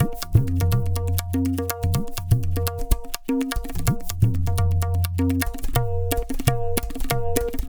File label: tabla, 125 bpm; Zero-G; Pure Tabla